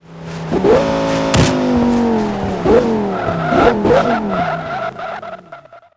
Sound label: throttle off, vehicles; gmod; tdmcars; r8 v10